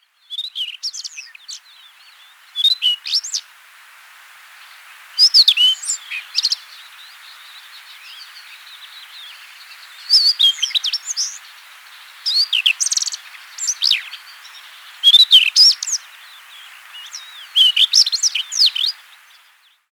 rufous tailed robin

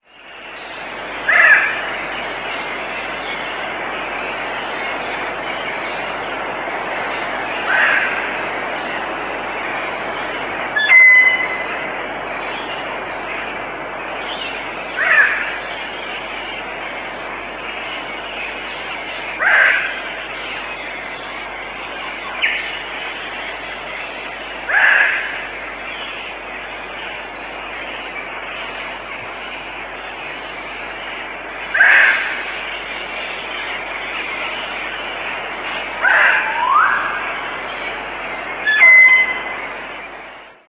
eclectus parrot

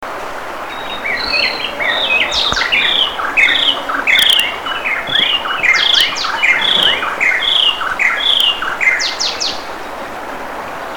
rueppells robin chat

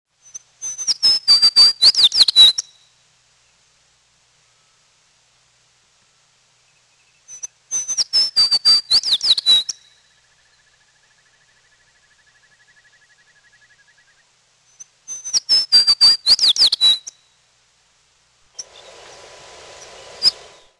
american tree sparrow

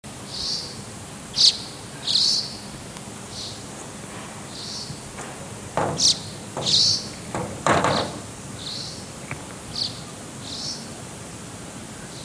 andean swallow

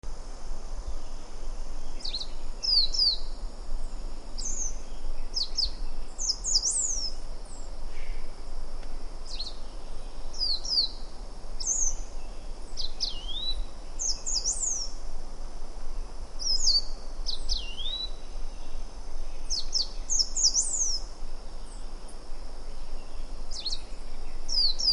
white naped brush finch

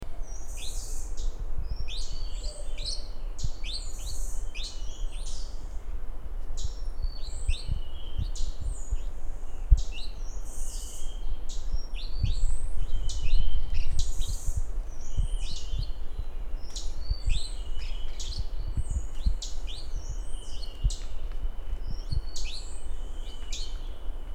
rusty faced parrot

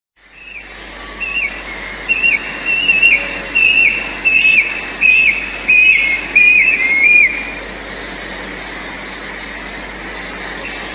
brush cuckoo

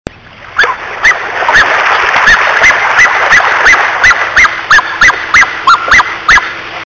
black necked swan

